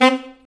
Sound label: SAX A. STAB, Alto Section; Roland LCDP07 Super Sax; Alto Sect FX